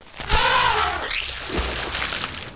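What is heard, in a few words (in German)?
Elefant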